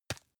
tissue tap

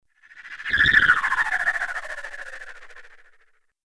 swell distorted short

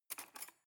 cutlery cake fork drawer grab

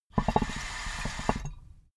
water pipe puff bubble short

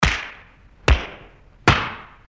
handgun multiple shots

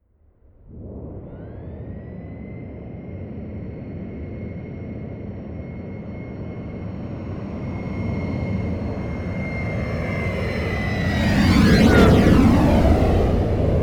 plane boeing 737 flyby close front

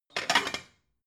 plate cupboard take slide hard

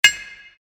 hammering

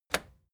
record player play release

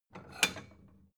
plate small take slide soft

